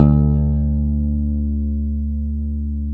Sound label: WAL BASS; AKAI S6000 CD-ROM - Ultimate